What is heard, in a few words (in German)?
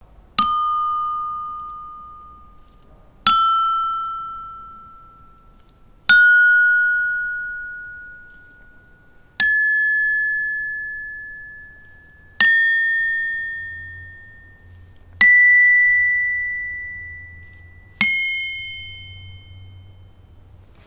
Saron klein Spitzhammer, gamelan; HB-Samples